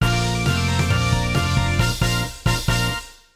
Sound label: fanfare cup; VR Karts